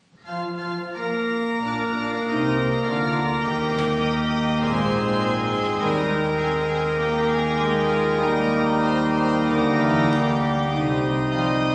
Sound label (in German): Orgelnachspiel